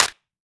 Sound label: chinned, Clap n Snap, Snares